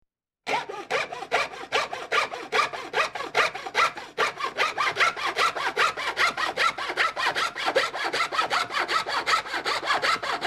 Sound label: Construction Hand Saw Short Strokes, Sawing Metal